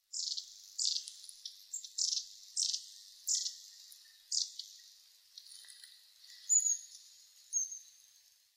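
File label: enaga, long-tailed tit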